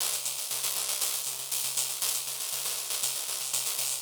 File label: Drum Loops 119